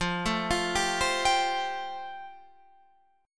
F Major Add 9th, Guitar Standard; Fadd9 arpeggiated; JGuitar

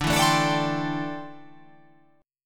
D 7th Suspended 2nd Suspended 4th D

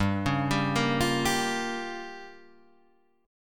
Bb Minor 6th, guitar

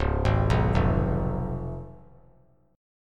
Db 7th Db down; Db7 arpeggiated; JGuitar

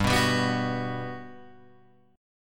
G Major 7th, G, down, Guitar, Open G

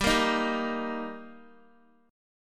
G Minor Sharp 5th G Guitar, chord; Gm#5 strummed; x,10,8,8,7,x

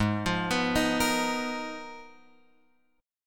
G sharp Minor 6th Add 9th, Guitar Open F; G#m6add9 arpeggiated; x,3,5,6,6,5; JGuitar

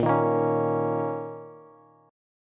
A sharp Minor Double Flat 5th, down, Bass; A#mbb5 strummed; JGuitar

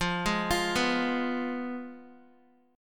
F Diminished Guitar; Fdim arpeggiated; JGuitar

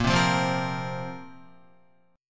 Bb Suspended 2nd Bb down Guitar; strummed

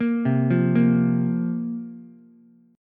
A sharp Suspended 2nd down Ukulele; arpeggiated; A#sus2; JGuitar

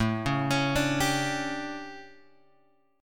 A 11th A, guitar chord; A11 Chord {5 4 X 6 3 3} arpeggiated

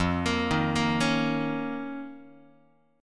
F 7th Suspended 4th Sharp 5th F down Guitar

